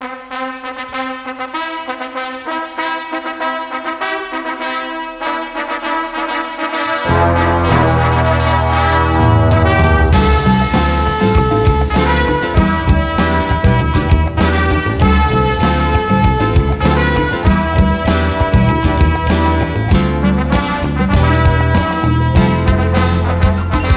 Fanfare; Rocky; XBOX

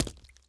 footstep walk water

